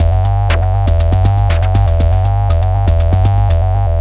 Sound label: Drums Strange